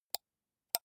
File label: computer mouse left click single slow